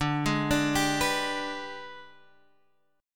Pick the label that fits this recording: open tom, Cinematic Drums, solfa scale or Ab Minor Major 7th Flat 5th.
Ab Minor Major 7th Flat 5th